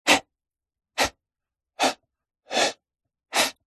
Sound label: human male 5x very short exhales breaths; zapsplat